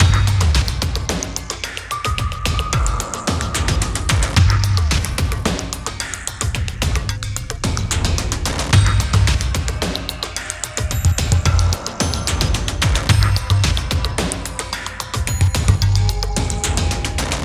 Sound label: Dungeon World Main LOOP